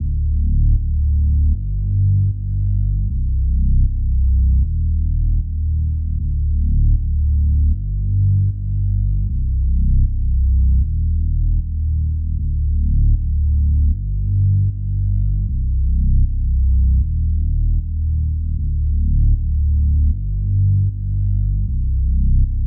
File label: 155 BPM G Min Bass; Cymatics; Utopia; Houston